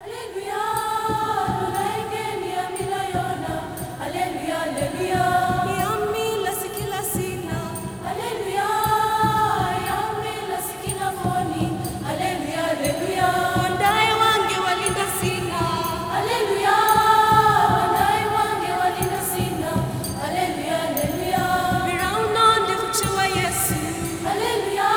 ALLELU FEM, ALL GIRLS; Spectrasonics; Heart of Africa 2

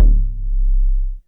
TOM SFT; Back In Time Records; Big Bang

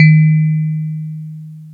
DX PIANO

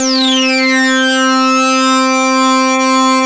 SYN, SYN Analog; Sound & Vision - Gigapack I CD 2 (Roland)

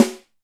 Snare, Alesis DM5

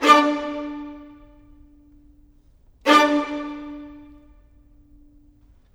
Cinematic Viola Violins; Sonic Foundry (Sony Creative Software); Orchestral Series VOL-3 - Cinematic

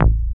DR. LOOP, BASS; X-Static Goldmine 3

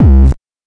BANK1 BD, NES DPCM; MIDINES

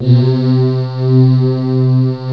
MINIMOOG; OLD SYNTHS; Rubber Chicken Software Sample Bank

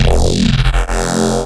Wobble F, bass

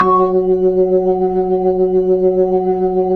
B-3, Jazz

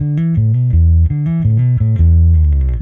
EBass Neck Fing 170 E2; musicradar; bass boutique

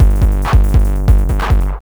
PW 909 140, Hard & Loud Techno

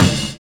CRASHING; Univers Sons

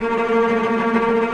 CL TREM F, Orchestra; AKAI Advance Orchestra Upgrade 97 Vol.1

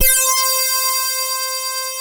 RV LEAD C5, Analog Fantasies; USB Soundscan vol.49; AKAI; ARP

